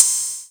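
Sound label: ms20 hi-hat open; MS-20 drum pack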